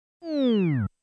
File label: Window Close